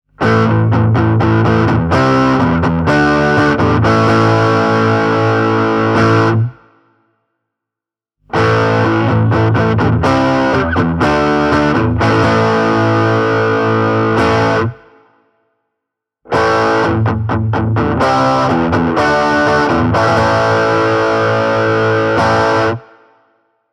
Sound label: Epiphone Dot overdrive; puoliakustinen sähkökitara